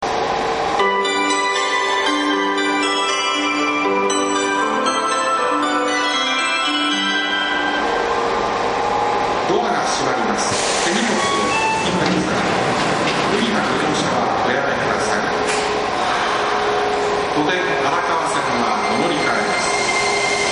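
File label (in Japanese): zoushigaya hassya, departure melody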